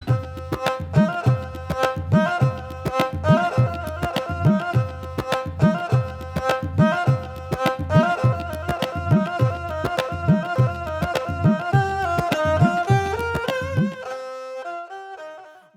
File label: Instrumental